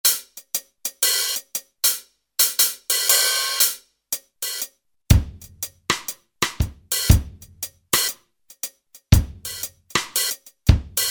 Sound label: Reggae Kit; Roland L-CDX-01 Rhythm Section Instruments